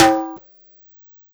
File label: DRUM; DRUM SUGAR